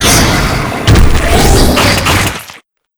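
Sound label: boss spawn; Fireamy; lava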